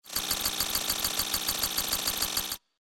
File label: computer downloading sound